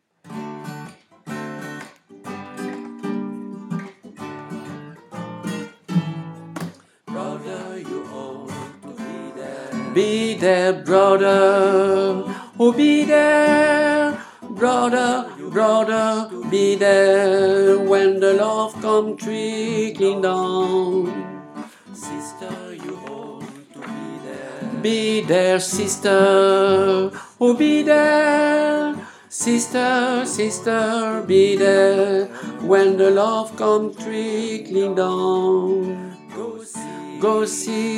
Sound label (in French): Hommes, chorale